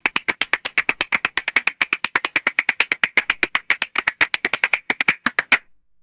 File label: rapid cartoon footsteps accelerating and stopping